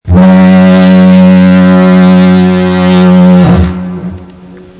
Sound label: fog